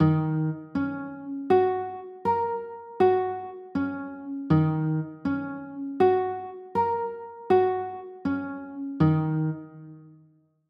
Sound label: e flat m7, chords